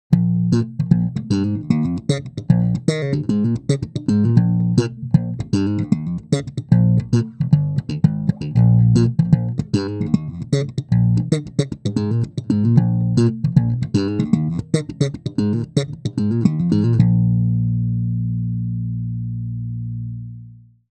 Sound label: phil jones double four bg-75, fodera slapped, mic